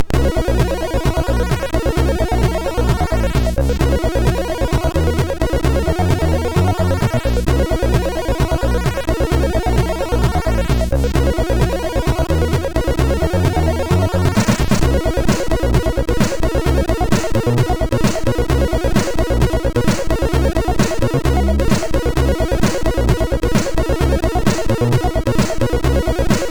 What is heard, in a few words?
funk, MOS6581R2